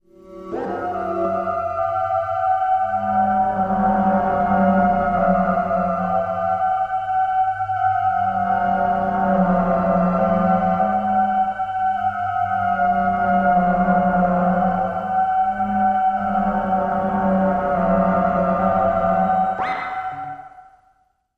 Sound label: Dementia Musical Pulsers